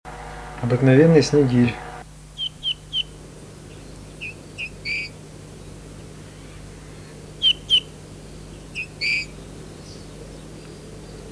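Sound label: Eurasian bullfinch voice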